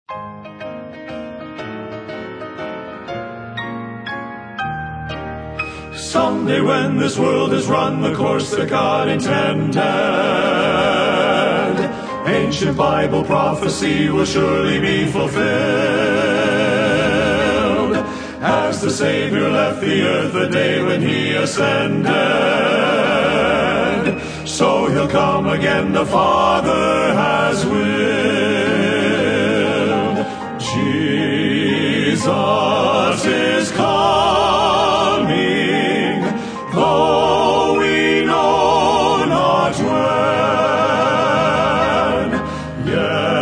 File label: Men's Quartet